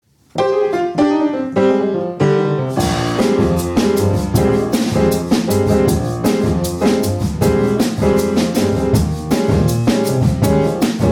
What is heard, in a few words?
blues boogaloo; trionyx; tacktime